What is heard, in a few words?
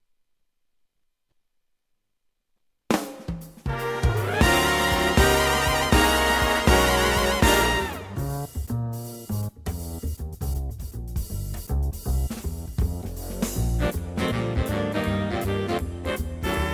Big Band; tyros2